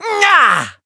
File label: Voice attack; nero